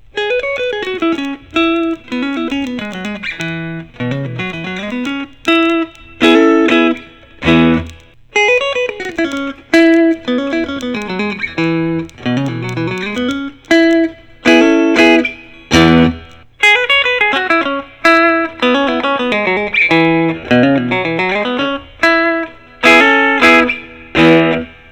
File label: Fender Custom Shop Wildwood 10